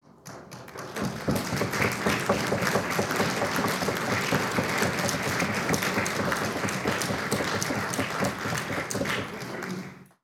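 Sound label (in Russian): аплодисменты хлопки и стуки 40 человек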